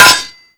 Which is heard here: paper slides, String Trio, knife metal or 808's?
knife metal